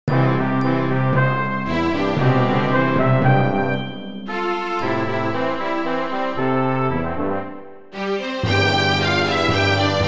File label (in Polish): hymn; wandystan; symbole